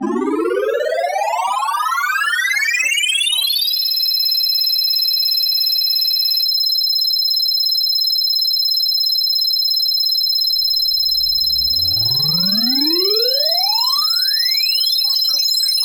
result gauge; Heaven Studio Plus; rankings

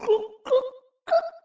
drowning; sm64coopdx; char-select-extra-chars; toadette

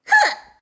punch yah; daisy; sm64coopdx; char-select-extra-chars

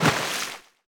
jump Player; HeavenStudioPlus; splashdown

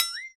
short jump; player; HeavenStudioPlus; see Saw

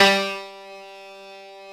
glee club, strings; rockers

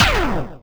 record swipe; HeavenStudioPlus; dj School

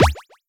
jump Just, pajama Party; HeavenStudioPlus

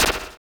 dispense Noise; HeavenStudioPlus; spaceSoccer

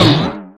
miss; HeavenStudioPlus; seeSaw

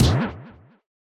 punch; Flipper Flop

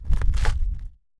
magma cube small; mobs; Mineclonia; mobs mc

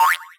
miss; HeavenStudioPlus; tapTroupe

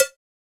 donk; HeavenStudioPlus; clap Trap

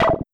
fish; HeavenStudioPlus; night Walk Agb

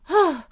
panting cold; peach; sm64coopdx; char-select-extra-chars

